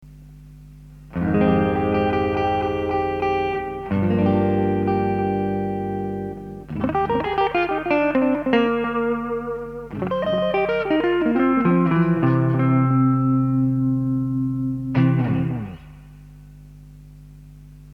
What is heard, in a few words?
VOCU VTE-1600 Analog Tape Echo, CLEAN ECHO; MODE：SHORT ECHO TIME200ms; REPEAT0 ECHO LEVEL10; FLUTTER0; これはフラッター機能がついていて、残響音をわざと揺らし、テープ / 独特のディレイ音を醸し出せる。